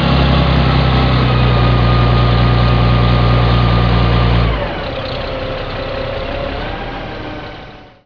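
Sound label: bulldozer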